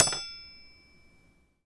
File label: Heavy Metal Spoon